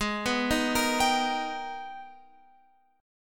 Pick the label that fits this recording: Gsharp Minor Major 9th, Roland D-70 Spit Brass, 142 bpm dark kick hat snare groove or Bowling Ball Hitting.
Gsharp Minor Major 9th